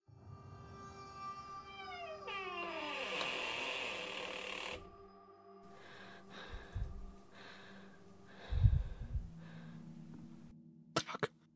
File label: horror pallas cat